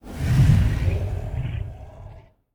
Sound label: Owl Bear main